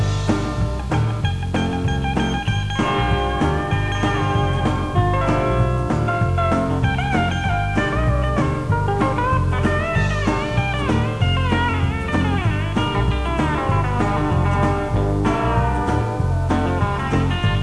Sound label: solo